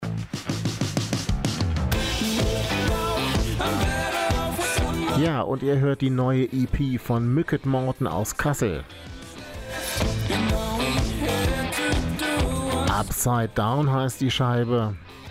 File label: Ducking; Tascam Ministudio Creator